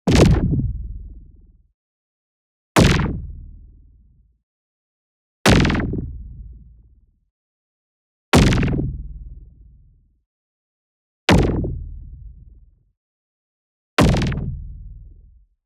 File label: IMPACT SMALL Low Filtration; boom library; Matter